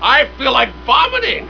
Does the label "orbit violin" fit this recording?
No